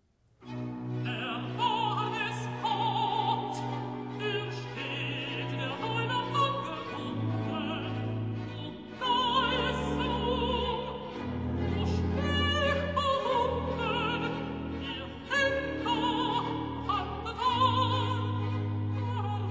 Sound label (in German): reciatat erbarm es gott, alt; bach